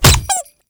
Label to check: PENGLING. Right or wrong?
Wrong